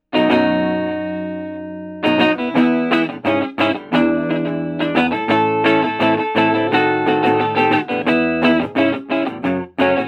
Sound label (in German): chords ohne